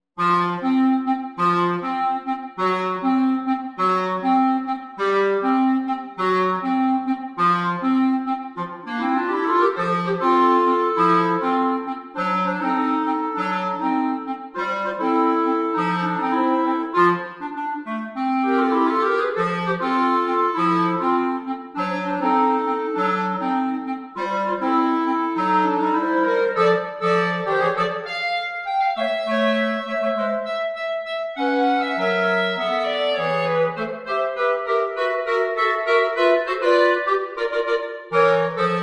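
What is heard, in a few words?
trii jazz, clarinet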